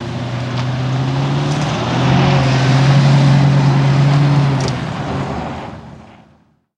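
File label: Cadillac Long Acceleration; Cadillac, Long Accelerate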